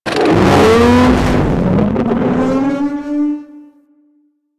Thumper Thump Warning C; Warframe; Grineer Thumper Bot

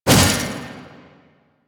Mace Whoosh; Warframe; Duviri; Duviri Dax; Weapons; Duviri Mace And Shield

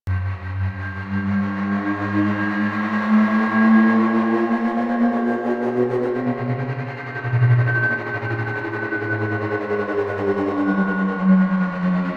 Thruster Ship Thrusters Loop; Warframe; DE; Vehicles; RailJack; RailJackShip; AlternateEngines

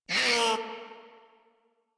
Kavat Vocal Pain; Warframe; Khora

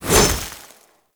Hover Whizzby Angry; Enemies; Warframe; Warframes; Pax Duviricus

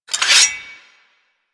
Axe Equip; Warframe; Weapons

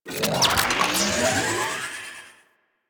Drone Start; Warframe; Deimos; Cephalon Drone

